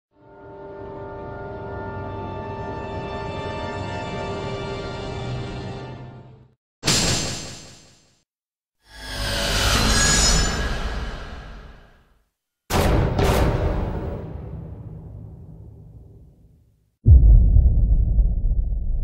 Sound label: Dramatic Suspense